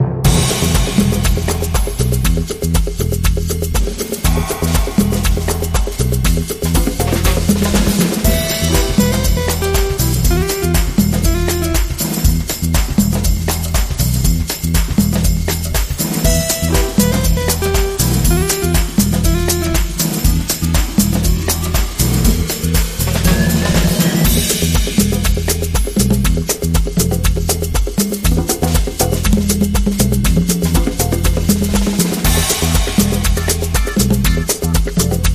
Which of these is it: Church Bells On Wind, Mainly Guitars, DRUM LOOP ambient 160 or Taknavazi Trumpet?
Mainly Guitars